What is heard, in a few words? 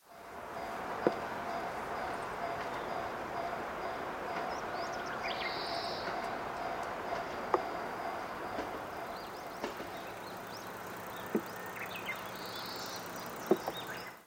raindrops on D50